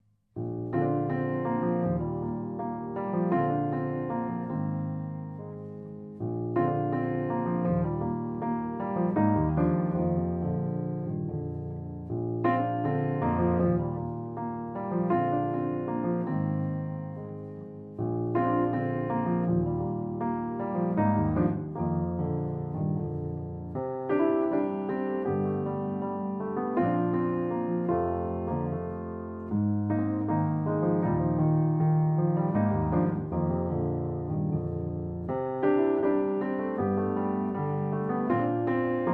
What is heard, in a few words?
Solo Piano